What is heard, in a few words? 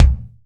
thumpy kick sample c key